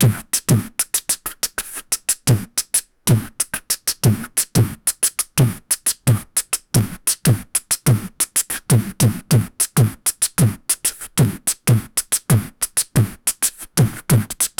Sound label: beatbox kick; incredibox